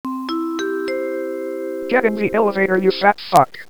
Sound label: elevator